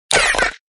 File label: Acorn Break; Acorn Baki